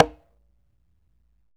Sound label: L CONGA SL; Cuba; AKAI S6000 CD-ROM - Volume 5; CONGA 2